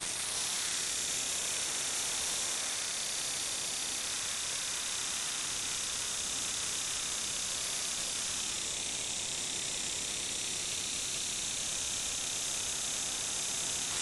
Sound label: rainforest bugs choir heat alien space sci fi planet ambience exterior; fvtt sonniss gdc audio